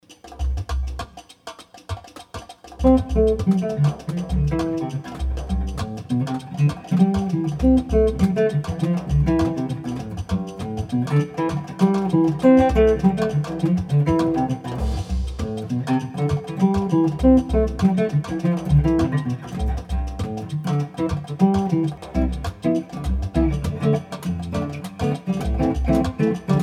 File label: Swinging Bossa